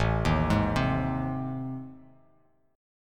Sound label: A Minor Major 7th Flat 5th, Bass Standard; arpeggiated; AmM7b5; 5,6,6,5; JGuitar